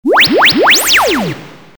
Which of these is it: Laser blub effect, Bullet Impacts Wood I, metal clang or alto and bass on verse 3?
Laser blub effect